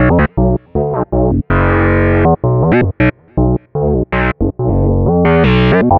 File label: Synth Bass